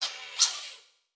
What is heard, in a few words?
Multimedia Internet CD-Rom Flash Animation